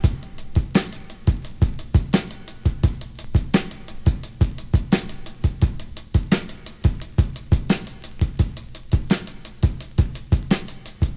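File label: 86 bpm drum loop d key, kick tuned to the D note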